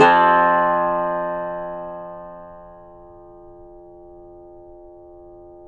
yayli tambur

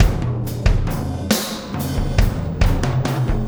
Landing Party Beat, Processed Drumkits

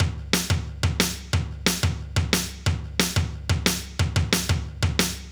Kick Snare Loop

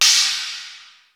CHINA, DrumBase5000; Crypton; CFZ KIT 2

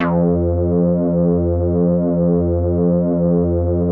LIGHT SYNC, MOOG, Bass; Masterbits CLIMAX 7; MORE MOOG

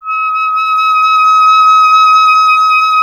clarinet D#

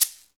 shakers

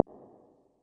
snap, Yamaha Tenori-On Snapkit

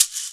SHAKE LOOP; E-MU Formula 4000 Series Vol. 2; Orbit Presets

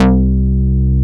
Synth Bass, Roland

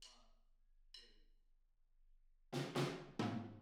Intro, Room, Drums; Sonic Foundry (Sony Creative Software); Drums from the Big Room The Mixes; Demo Projects

